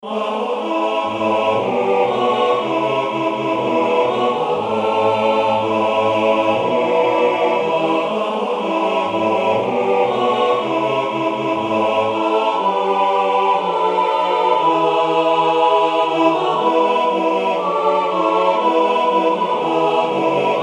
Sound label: satb, church choir; Original Compositions for Church Choirs